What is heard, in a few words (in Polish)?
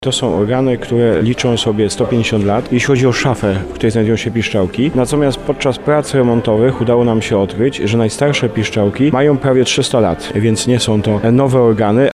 organy godzinkowe